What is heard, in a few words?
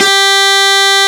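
Distorted Guitar G2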